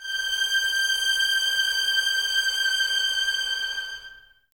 G 6, 11 Violins, Soft; Miroslav Vitous

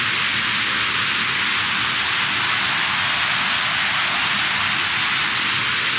Panned Noise, non tempo loops

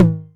Tom Hi, Novation Drumstation; Novation DrumstationV1

